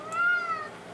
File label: Cat Meows - Food Time; Micro Pack